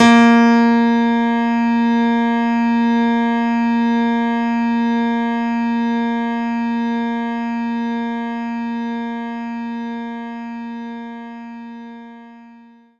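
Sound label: Grand Piano A sharp 3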